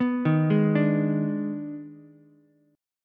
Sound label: Eb Major 7th Suspended 4th Sharp 5th Eb, Ukulele; arpeggiated; EbM7sus4#5